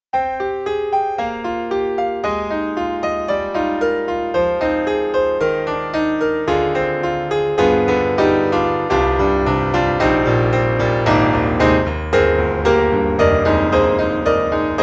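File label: Bosendorfer 290 Close Gospel and Blues, piano; Steinberg The Grand 3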